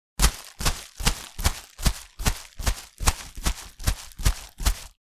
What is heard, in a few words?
Footsteps running on wet mud